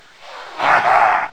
alert; bioclone

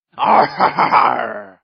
laugh; hl2dm

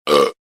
burp

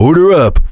heavy meleeing; tf; duke

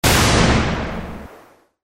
synth rifle

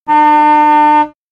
horn; kartsounds

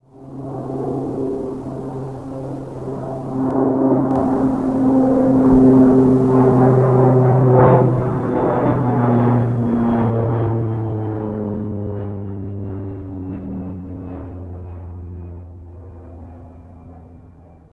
flyover; dod

